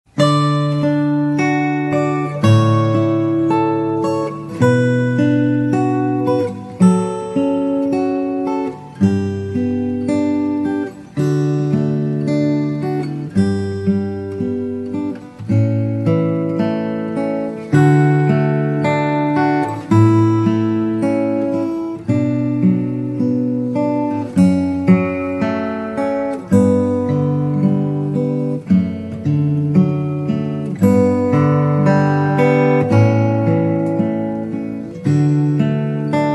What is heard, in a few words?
Pachelbels Cannon